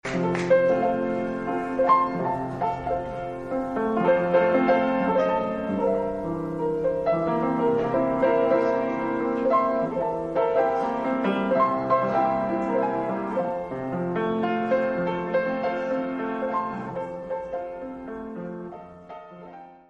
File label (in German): instrumental